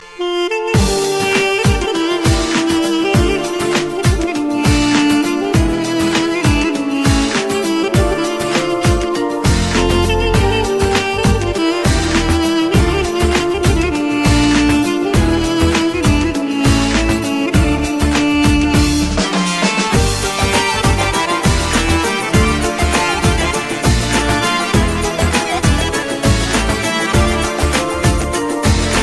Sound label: Duduk; Nostalgy